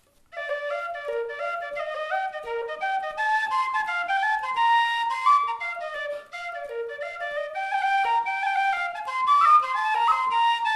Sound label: Freeman Tweaked Bb Generation Reel, whistles